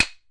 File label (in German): found sound holz; Werkstatt Found Sound Kit